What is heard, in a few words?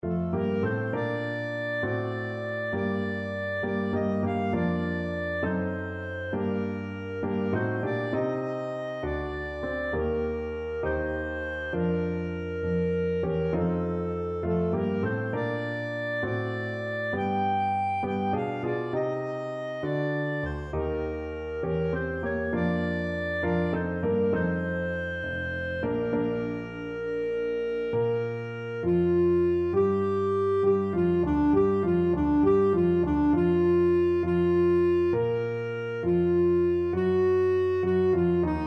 lift every voice, clarinet